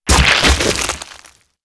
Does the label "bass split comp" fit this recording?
No